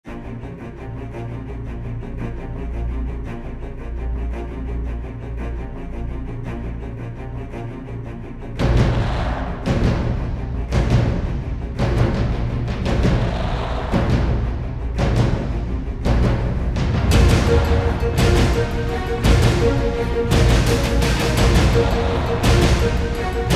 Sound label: No Choir